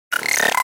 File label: Error glitch